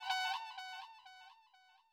Trumpet part3 8 beats

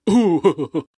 Yelp

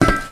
Metal footstep

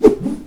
Wall Climb Jump; player

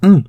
Chorus hurt

